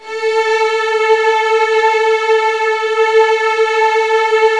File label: Virtuoso Strings